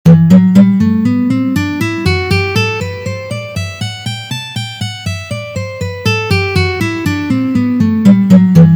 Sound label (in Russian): Gamma Sol mazhor Tri noty pozitsiya